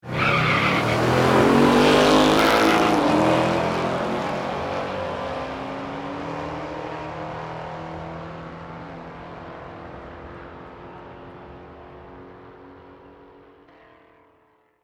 Dragster Away, Sports, Dragster, Drag, Away, Two, Cars; Tire, Squeal; Race Car Sound Effects